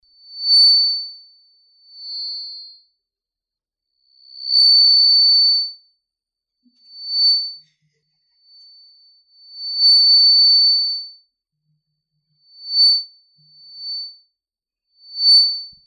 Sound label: feedback